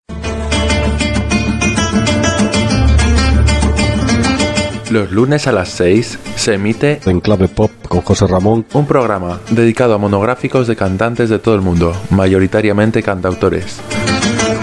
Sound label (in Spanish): jingle pop; enclave pop 2023